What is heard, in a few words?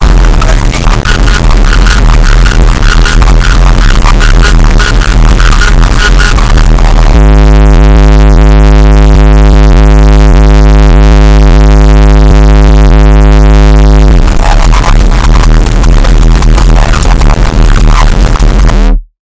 super bass